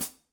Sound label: formula 602 classic sounds 15 medium hi-hat chick; paiste